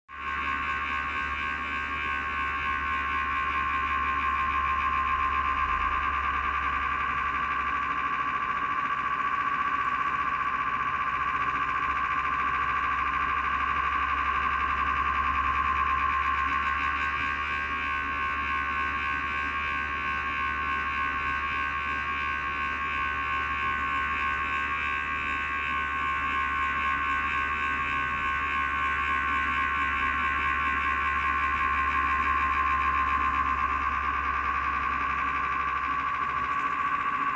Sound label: Harddrive failing